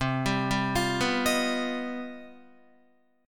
C Minor Major 11th C down Guitar 4F 4A 5C 5G 5C 6E; CmM11 arpeggiated; JGuitar